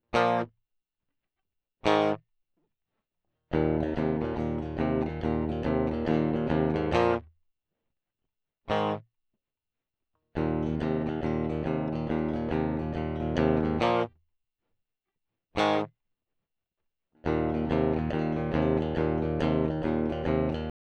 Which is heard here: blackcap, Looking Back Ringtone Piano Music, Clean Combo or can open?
Clean Combo